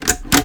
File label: key, braille; braillesim